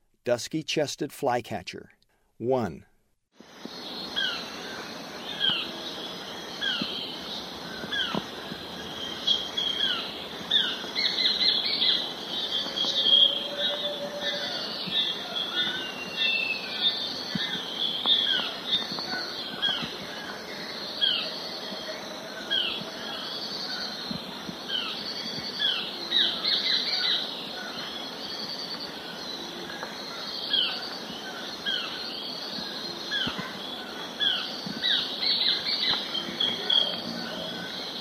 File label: Dusky-chested Flycatcher; S. America; Eastern Lowlands